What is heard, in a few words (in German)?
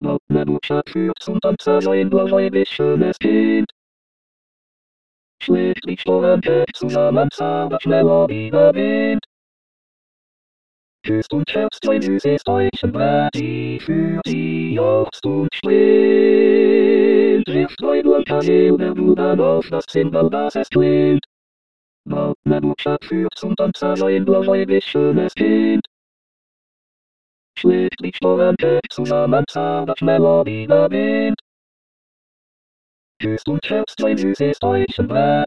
Zigeunerlieder tutti